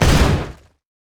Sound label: steam golem basic attack; LoL; blitzcrank